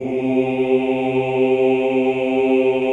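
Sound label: choir C2, Ooh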